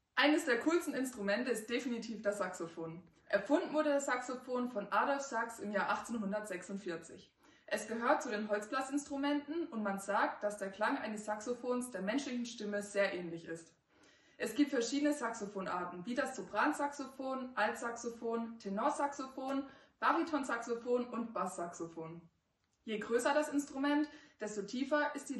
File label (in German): Saxophon